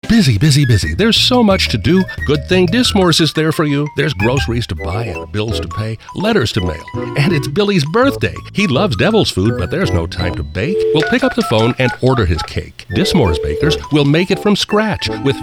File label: jingle